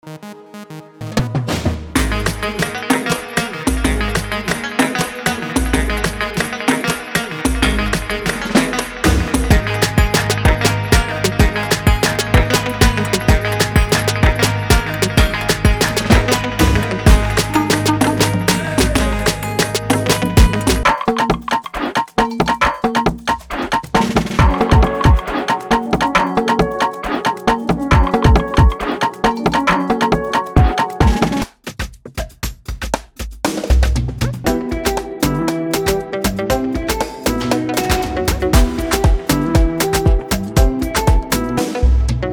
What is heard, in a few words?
Paris Afrotrap